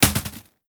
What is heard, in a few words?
Hit; moul